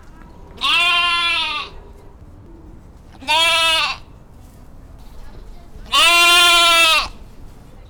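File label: Capra domestica adult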